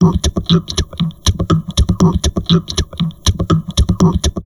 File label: TALK, Drum Loops Crazy Processed